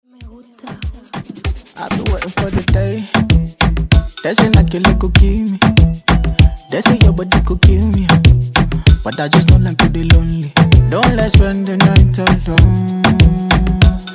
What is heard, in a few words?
Afro Rton; Redrum